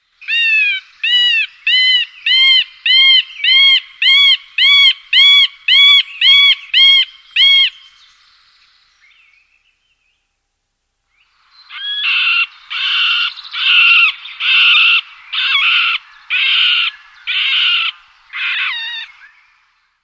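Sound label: middle spotted woodpecker; Dyatel sredniy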